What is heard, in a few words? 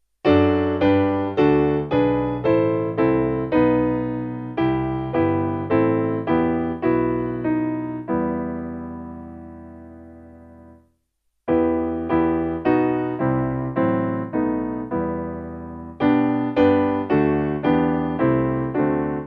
Piano